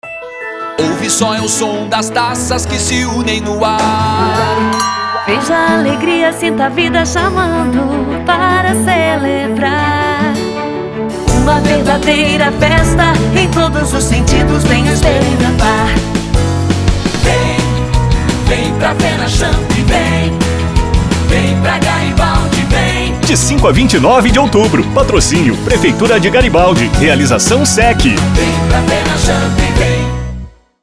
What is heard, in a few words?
Jingle; FENACHAMP